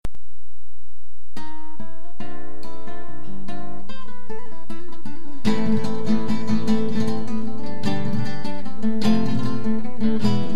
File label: flamenco